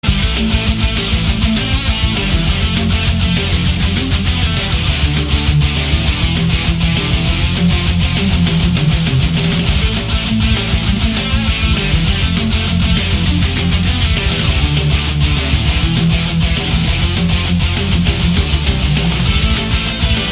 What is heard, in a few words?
Arab Rock